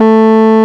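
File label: RMI piano A 2, EP; Sonic Reality - Vintage Timetraveler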